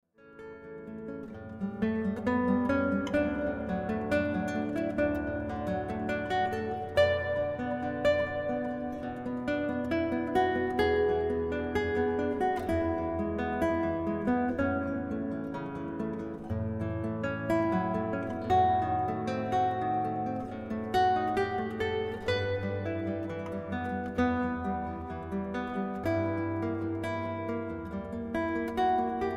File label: classical guitar; Ave Maria; Bach Gounod